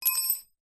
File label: industrial wrench flat drop hard floor; zapsplat